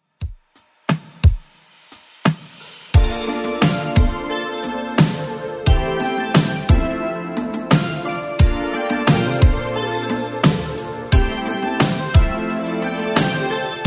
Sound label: 80s Redrum 88bpm